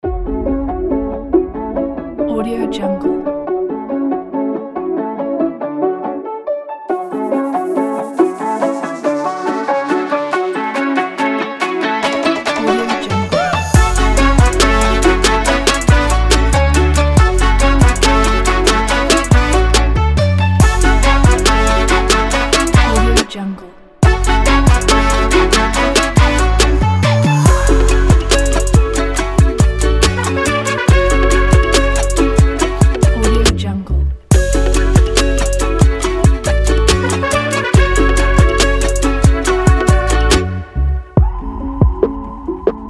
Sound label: latin beat